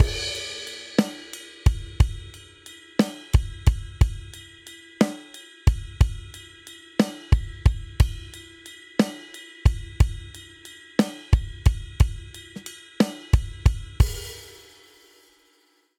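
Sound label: Swing Six RnB Outro 6/8 90 bpm, drums; Ableton; Factory Packs; Drum Booth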